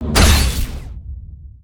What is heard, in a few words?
circle blast; creatures organic